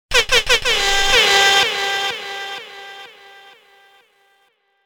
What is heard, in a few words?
Horn Echo